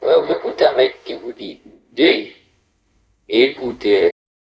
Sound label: jingle